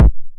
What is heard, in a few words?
909 BD Tape Sat